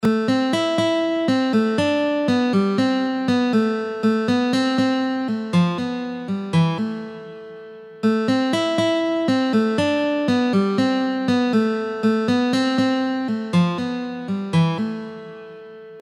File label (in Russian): Belaya noch na 1 strune